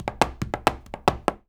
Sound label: 133 STEPS, Spanish And Gypsy